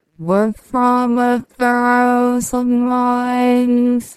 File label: singing